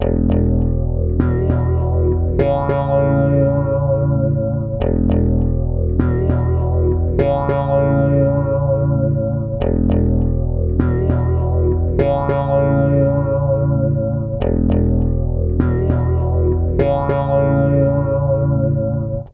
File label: Bass Guitar Loops; FM 57 - The Ultimate Sample Collection (1997)